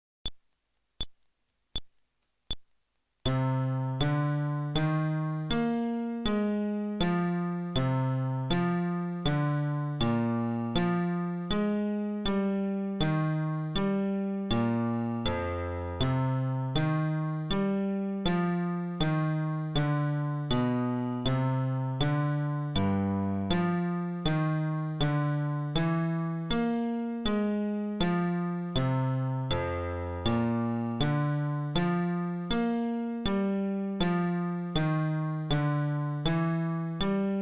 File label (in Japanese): フレットレス (テンポ80)